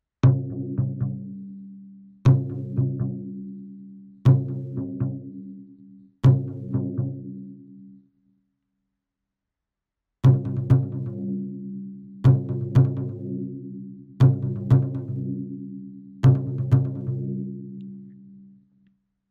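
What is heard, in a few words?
Taiko Bass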